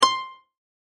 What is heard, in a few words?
precipitate harp; phet io wrapper sonification; molarity